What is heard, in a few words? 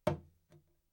hand impact